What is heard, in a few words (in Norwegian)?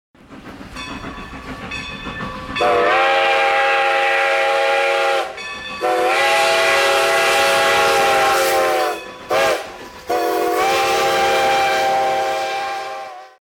Tog